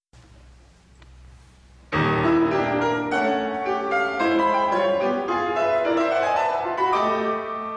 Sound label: Piano Sonata; Gideon Klein